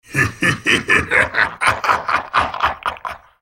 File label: laugh; Brand